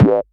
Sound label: Minimal Perc; Minimal Percussion